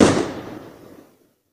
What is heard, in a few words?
cannon; bang sfx